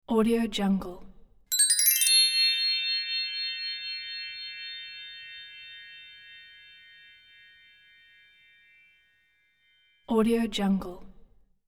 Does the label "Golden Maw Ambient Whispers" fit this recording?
No